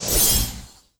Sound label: Heaven Sword Slash